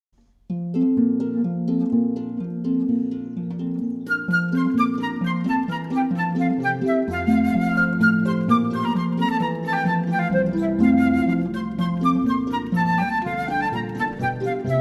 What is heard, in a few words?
Harp Flute; Le Basque